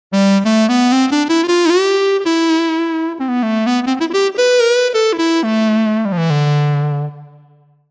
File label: Lyricon; TURBO VL